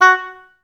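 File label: oboes staccato F#3, orchestra; Best Service; Peter Siedlaczek's Advanced Orchestra; OBS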